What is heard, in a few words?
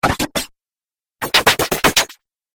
Dubstep Scifi Effects; Science Fiction Sound Effects